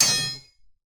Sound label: impact metal steel whack; zapsplat